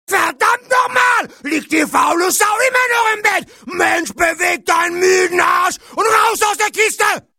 wecker sound zaertlich geweckt

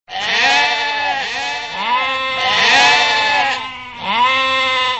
tier geraeusche sms klingelton schafe

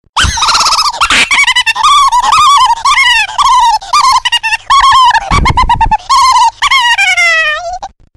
gremlins lachen

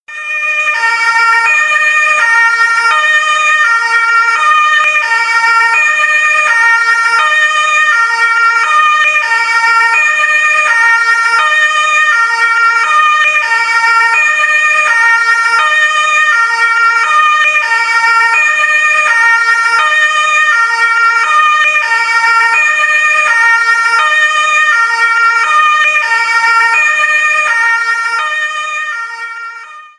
sirene klingelton polizei feuerwehr and krankenwagen; rettungswagen

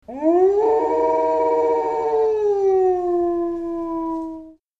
tierstimmen wolf

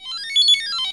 Alarmton Motorola LX4 Melderton

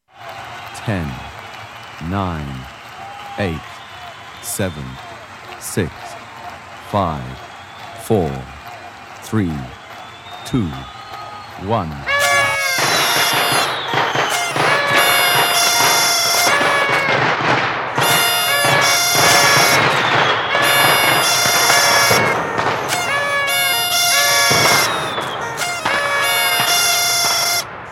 countdown feuerwek; silvester

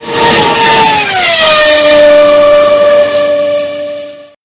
ferrari f1, f1 motor sound; michael schumach

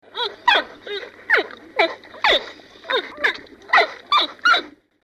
tierstimmen als sms toene panda

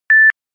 beep klingelton 1x piepen

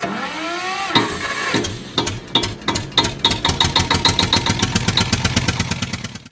klingelton traktor starten sound; alter trakto

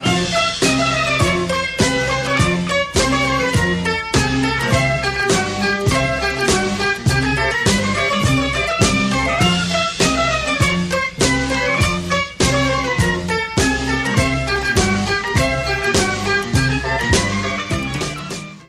zirkus clowns einmarschmusik; zirkus klingelton clown

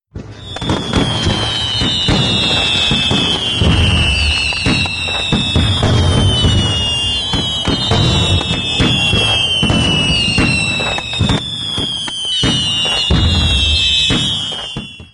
feuerwerk boeller and heuler sound; silvester

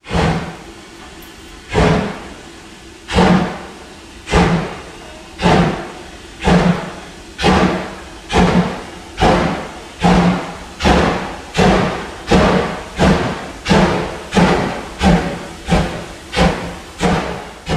Dampflokomotive, Dampflok pfeifen sound

